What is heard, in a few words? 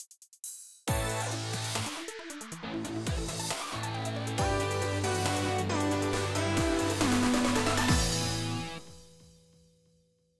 Golf Start Jingle; Nintendo Switch Sports